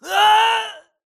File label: grunt death crush; Titanfall 2